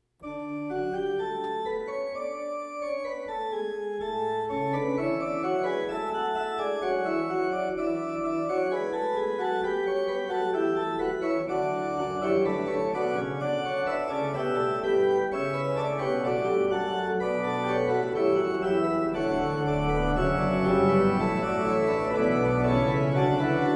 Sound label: fugue; duke street